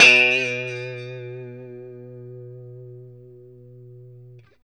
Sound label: POP B 2, STRAT guitar; Spectrasonics; Hans Zimmer Guitars VOL-1; JC-STRAT POP